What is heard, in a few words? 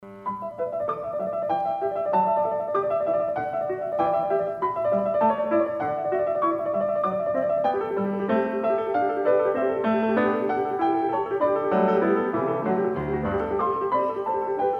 Bach Goldberg Variations